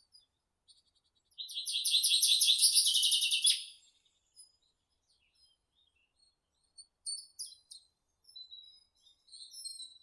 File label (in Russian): zyablik fringilla coelebs, zvuki prirodi